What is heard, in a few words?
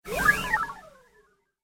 Fast Droid Flyby